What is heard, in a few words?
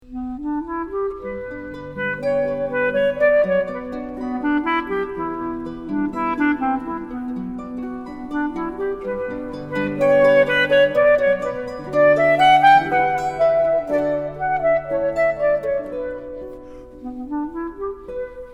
lyra